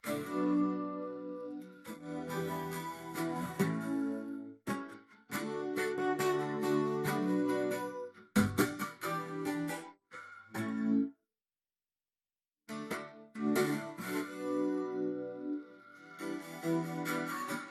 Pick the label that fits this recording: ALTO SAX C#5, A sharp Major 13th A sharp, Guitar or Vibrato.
Guitar